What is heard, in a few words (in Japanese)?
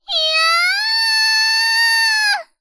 "いやああああ！", 妹; ハイグレボイス集